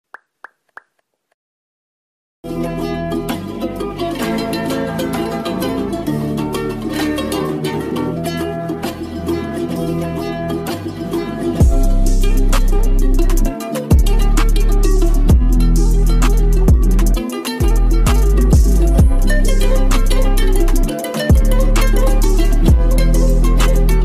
Georgian music ringtone trap mix

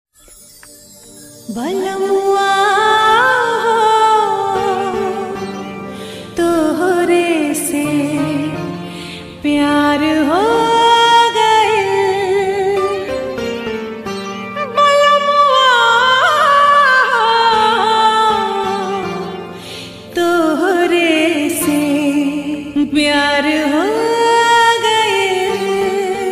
hindi ringtone bhojpuri ringtone

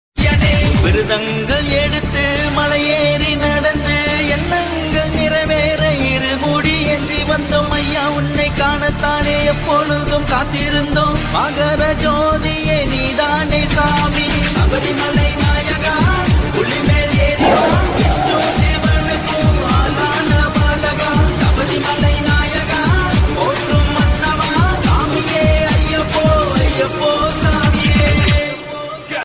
bhakti ringtone bgm; ayyappa swamy

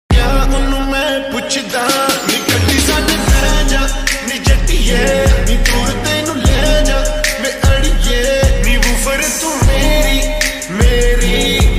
bgm amplifier attitude background ringtone

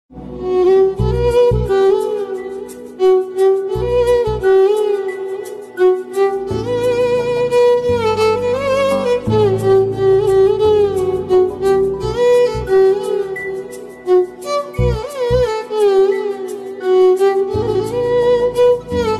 tamil love ringtone movie song ringtone; saamy movie; caron tunes

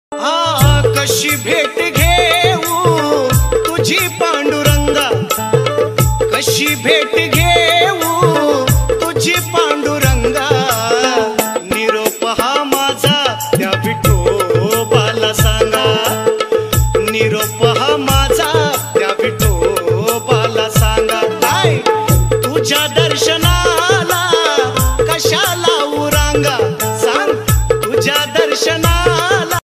marathi bhajan; Vitthal; devachi ringtone

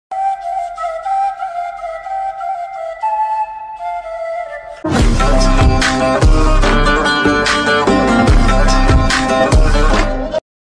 bgm ringtone mashup

